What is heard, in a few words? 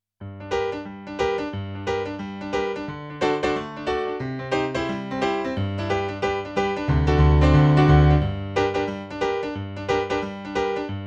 PIANO, RNR for piano